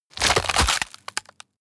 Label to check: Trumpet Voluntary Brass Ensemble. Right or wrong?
Wrong